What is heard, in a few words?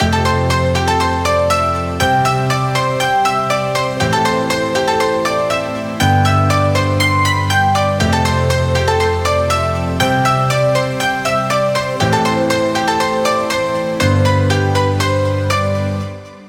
EDM Pop Melody Dance 120BPM